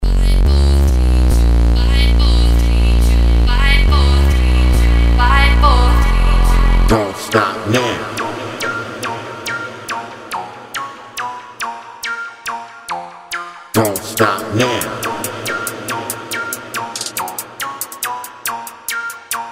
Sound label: trap